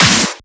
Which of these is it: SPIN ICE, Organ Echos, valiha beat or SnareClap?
SnareClap